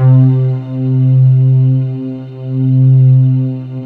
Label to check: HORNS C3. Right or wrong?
Right